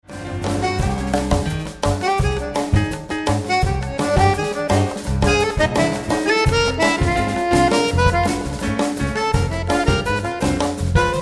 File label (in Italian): quartetto